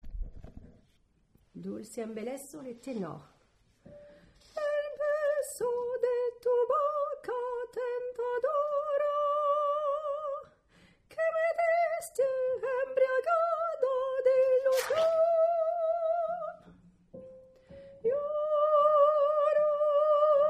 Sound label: Tenor